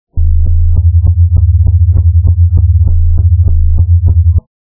Locked Groove

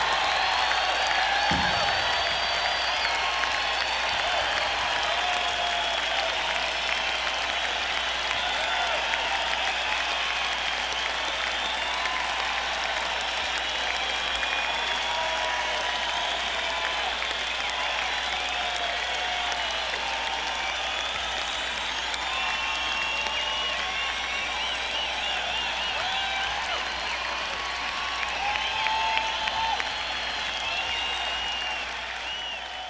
Encore Break; senn421

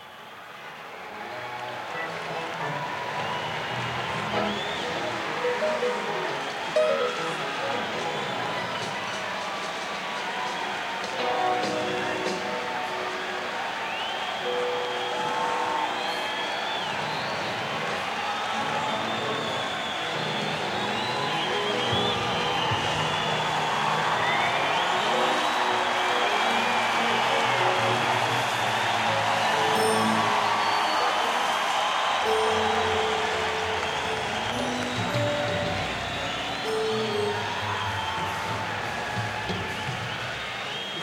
Tuning

